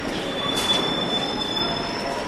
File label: Squeaky brakes